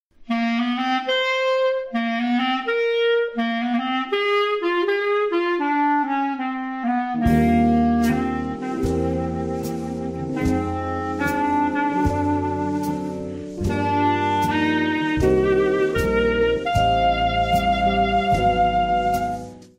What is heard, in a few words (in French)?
clarinette